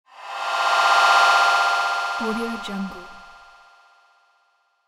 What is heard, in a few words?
magic tech transformation; lokohighman